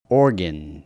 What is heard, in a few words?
organ